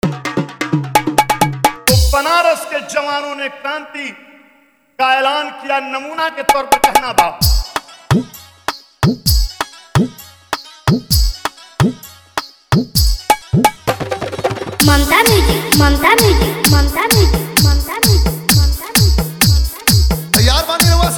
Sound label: Tabla Bhojpuri Dj Remix Song Full Vibration Mix